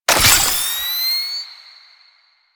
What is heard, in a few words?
Laser Flare Activate; Warframe; Lasrian; Lasrian Soldiers; Abilities; Prone Lancers